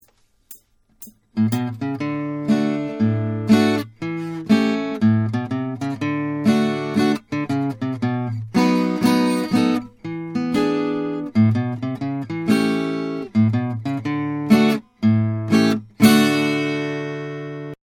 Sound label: blues